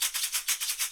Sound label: TAMBORIM